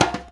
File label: DJEMBE, Percussion; InVision Interactive; Percussion Slam